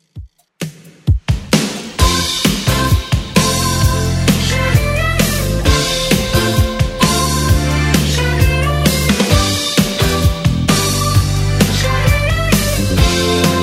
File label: 80s dance redrum 131 bpm